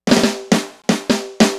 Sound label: Snare Fill